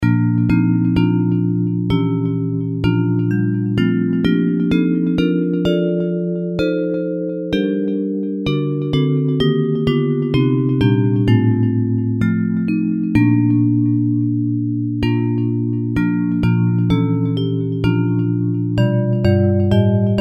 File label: Bells